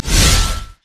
hammer smash; LoL; taric

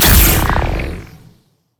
q explode; LoL; velkoz

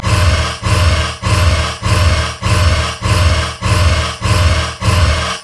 overheat loop; rumble skin03

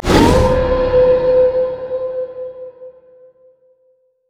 wolfman bloodscent enemy; LoL; warwick

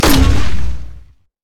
smoke grenade; LoL; graves

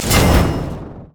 crescent sweep; Xin Zhao; zhaoyun

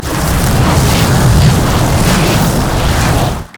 frost scorched earth; LoL; shyvana

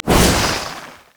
q dash; vi; LoL

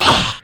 spectral basic attack; LoL; yorick

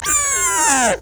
sapling charge; maokai

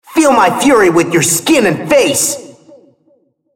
attack; RumbleSkin03

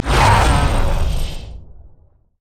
pain; LoL; trundle

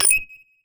microrockets; LoL; heimerdinger

